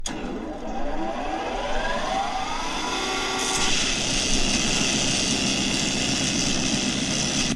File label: skibidi toilet laser